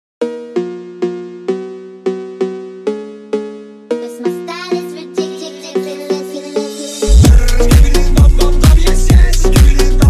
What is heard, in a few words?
phonk; Skibidi Toilet